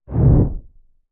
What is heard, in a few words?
Bass Impact Whir Syn